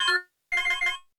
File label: BEEP SIGN; Total Control - Action Cutz; DARK